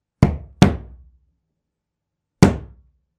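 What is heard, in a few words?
fist sound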